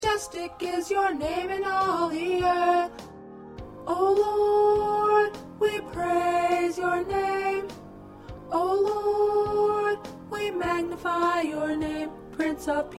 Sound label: Alto, choir parts